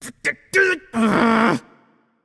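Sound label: nonverbal grumble; voicepack; smite; merlin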